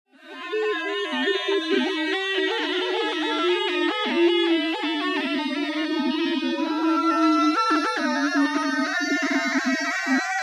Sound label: gliss